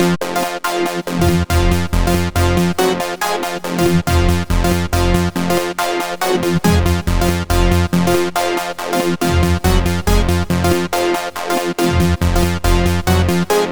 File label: Synthe